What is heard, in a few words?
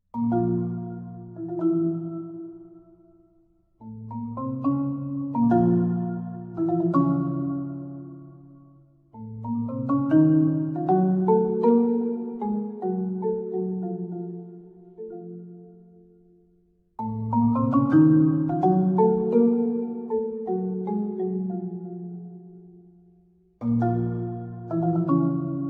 xylos; mussorgsky